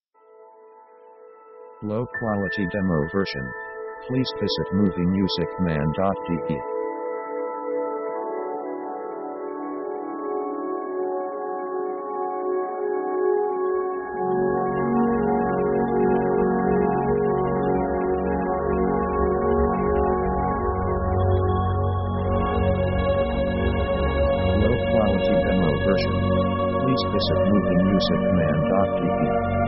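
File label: orchestral big ethereal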